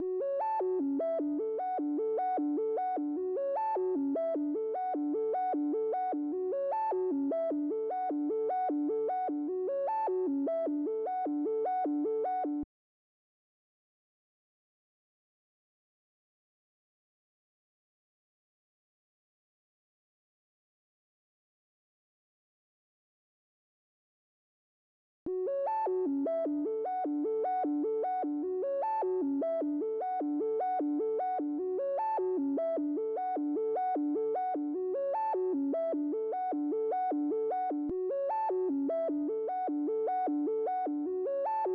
152 BPM F# Min Arp, melody loop stem; Cymatics; 2022 Melody Collection; Wavy; Off Route

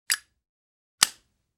Zippo Lighter Open; Fairlight Sound Lib

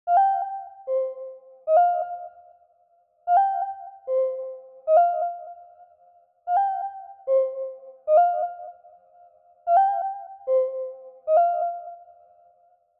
Lead, 150 BPM C Min; Cymatics - 2022 Melody Collection (BETA); Wavy; Ganja

